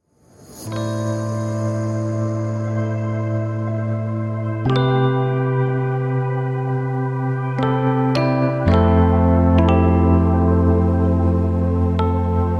Ambient Calm Promo; ambient beat

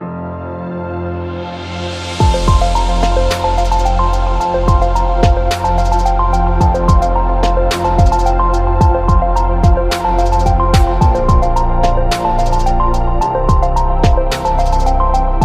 Luxury Ambient

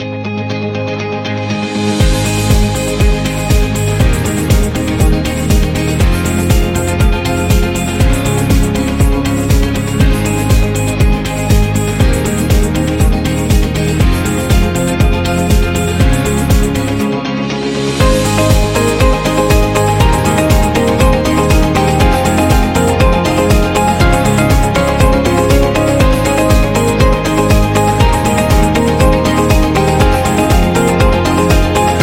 Upbeat Corporate; explainer

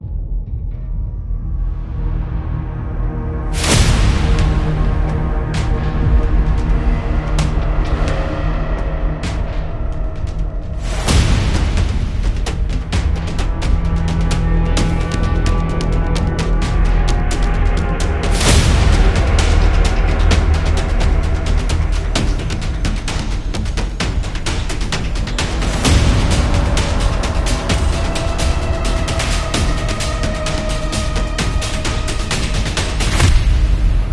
Military Conflict

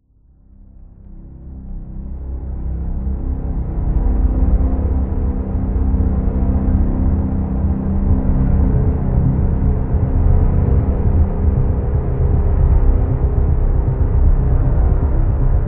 Dark Ambient; mystery

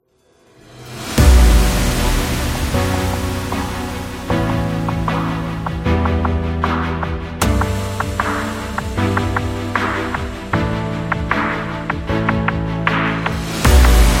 Cinematic, metal

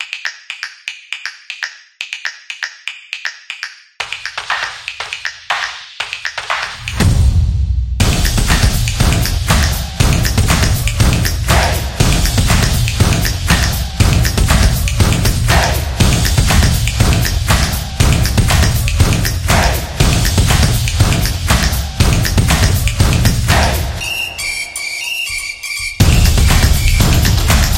Sport Music, sport drums

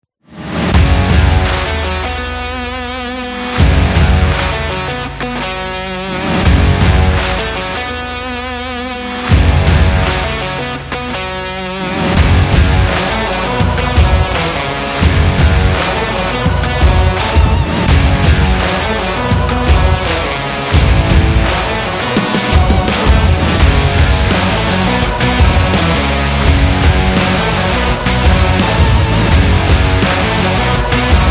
The Indie Rock

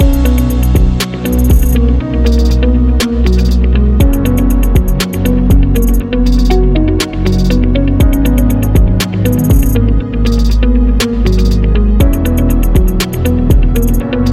Technology Loop; corporate background

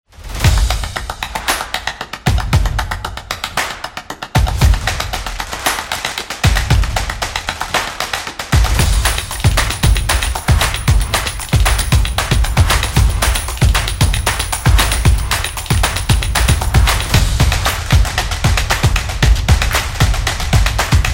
Opener Intro

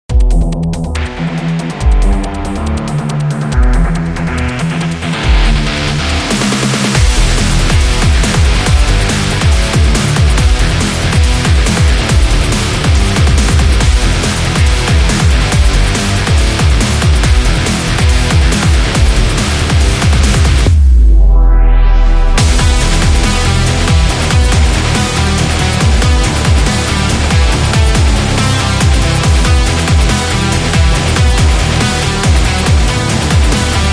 Action Sports Rock

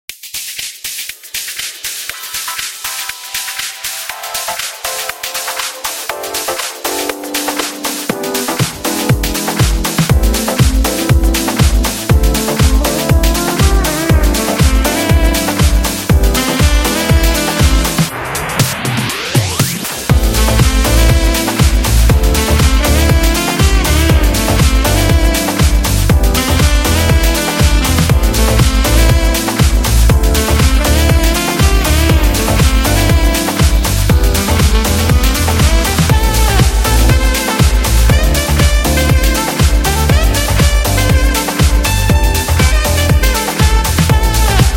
Trendy Bar

